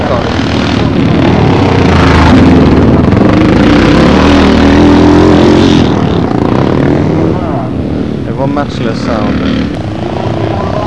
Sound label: Sound of Thumpers